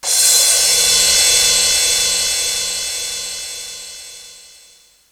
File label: MALLET CYMB; East West; Global Sounds; R8M